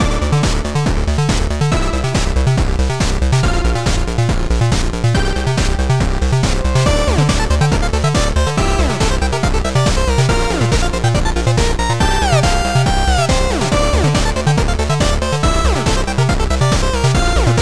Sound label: chiptune